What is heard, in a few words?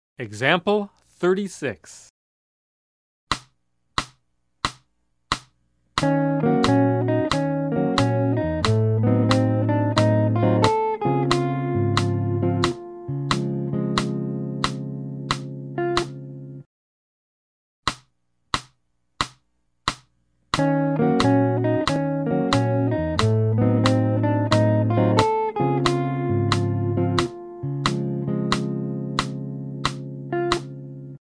Jazz